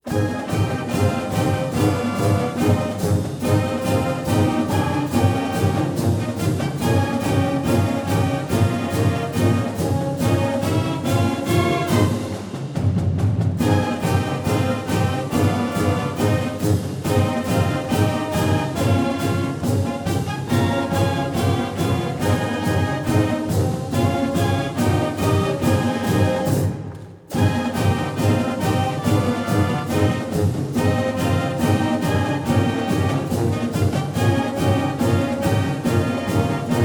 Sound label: fight song; waverly